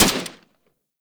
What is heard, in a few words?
Fire, Weapons; Warframe; Tenno; Dual Soma